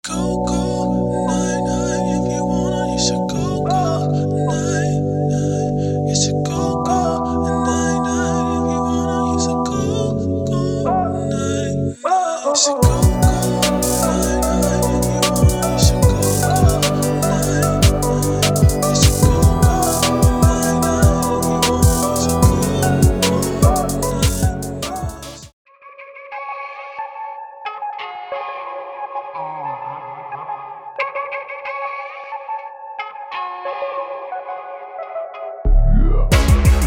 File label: Trap; Trap Dynasty